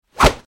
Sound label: Medium Speed Air Whoosh